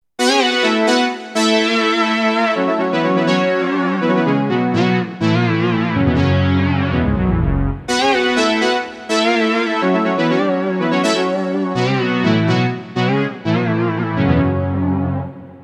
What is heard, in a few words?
big fifth, synth roland jd800; card demo